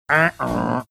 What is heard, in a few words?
imitating uh oh drop